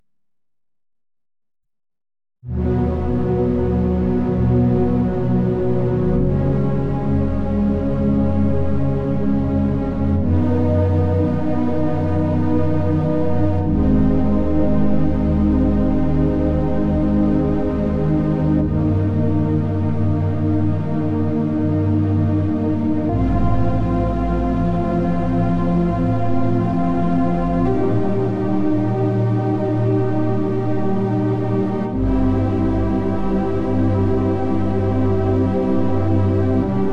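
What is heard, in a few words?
classical strings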